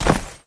step with armor; smite; chronos

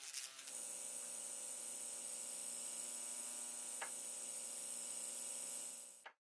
Landing Light; Pajamas; smite; kumbhakarna

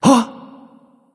surprise; smite; hou yi

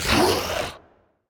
grunt; fenrir

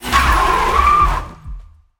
boar charge; smite; god artemis

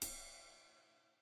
drums ride; smite; raijin

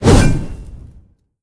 pwr swing buff; smite; gilgamesh

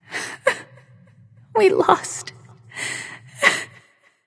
boo hoo; smite; jingwei

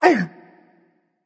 ouch berserker; cuchulainn

